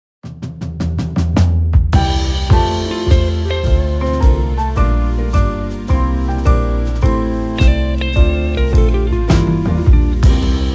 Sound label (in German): Playback Band